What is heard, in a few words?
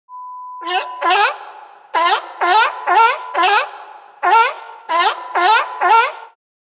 Sea Lion